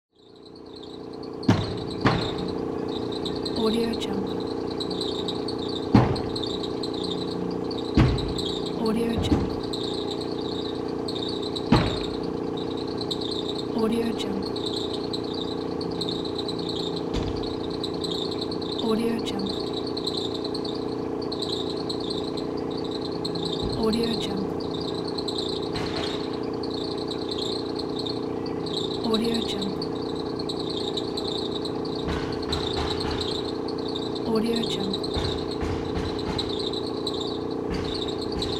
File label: construction with air compressor running